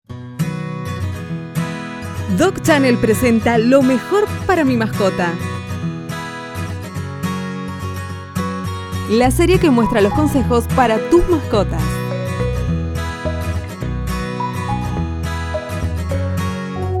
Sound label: ringtone